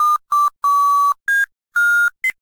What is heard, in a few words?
Tones, The tones heard when the door opens; Petscop2; in the Quitter's room